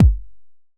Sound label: kick drive